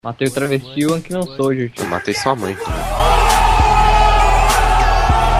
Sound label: whoo